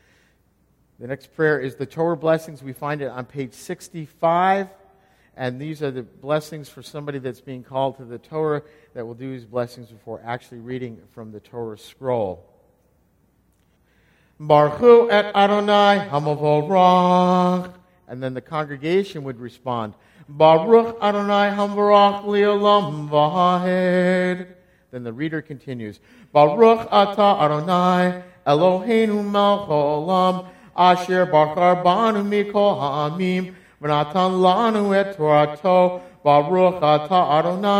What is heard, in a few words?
Torah Open Blessing